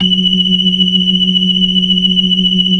BLOOD R, ORGAN; Keith Emerson Signature Series

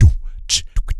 DO WA CHICKY, Vocal Planet, Blues Soul; Spectrasonics

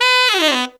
TEN BSF, Phantom Horns TENOR FX; Zero-G

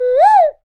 PRC Bird Calls; NorthStar - Global Instruments VOL-2

